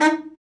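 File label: baritone sax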